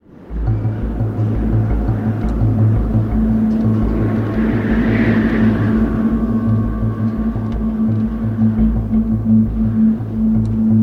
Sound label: Sounds Cinematics, Exit